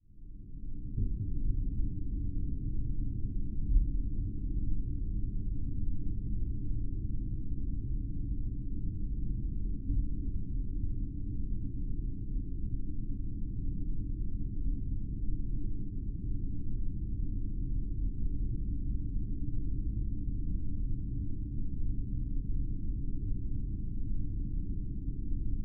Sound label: Geofon, Harbor, Ship, Dark; underground sounds